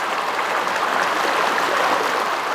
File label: Flow Water